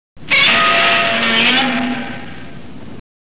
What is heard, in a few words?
dinosaur